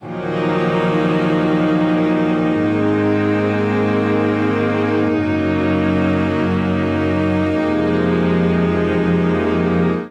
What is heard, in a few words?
Progression imin9-IVmaj9-IIImaj6-iimin11-viimaj9/i Eb Minor 95 bpm, string quartet; MIDI Clips; Awakening; Ableton; Factory Packs; Spitfire Audio